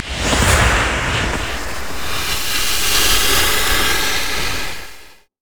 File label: smoke grenade loop; LoL; graves